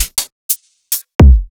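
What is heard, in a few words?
Hi-hat & Toms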